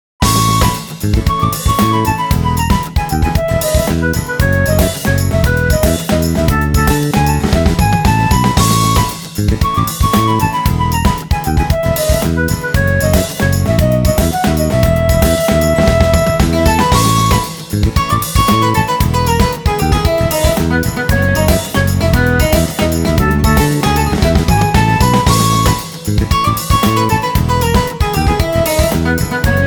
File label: walking on a sunny day, bgm